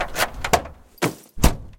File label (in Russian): zaklinivshij starij zamok avtomobilnoj dveri